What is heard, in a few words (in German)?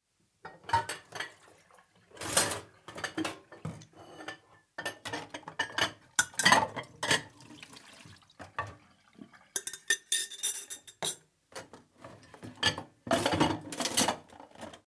Kueche Abwasch